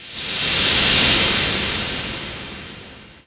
Wash FX; Zero-G Jungle Warfare 1, 2 & 3; Ambient

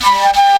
FLUTE GRV; Best Service; World Colours

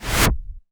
cinematic impact wipe

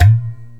INDIAN PERC

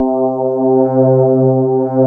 electric pianos; Wizoo Electric Pianos

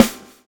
swing

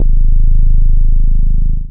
BAS MINI, MOOG; Sound & Vision - Gigapack II CD 1

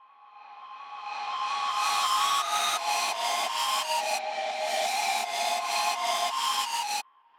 flute lead

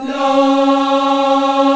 VOX, African Aahs, African Las; Roland LCDP11 Africa VOL-1

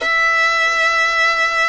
FIDDLE NOTES, E5, R

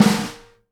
SNARE RUFF, Live Snares

